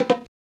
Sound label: Bongo item error; FREE SFX PACK VOL 2